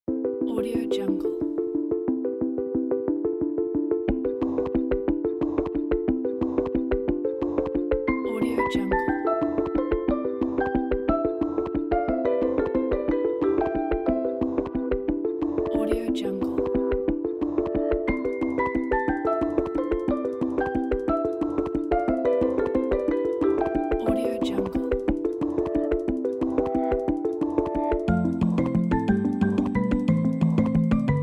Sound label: World Music; grammar games